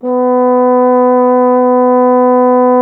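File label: bass trombone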